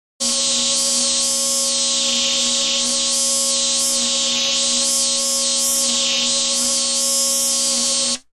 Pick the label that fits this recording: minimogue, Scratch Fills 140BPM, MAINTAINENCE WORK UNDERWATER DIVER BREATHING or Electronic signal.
Electronic signal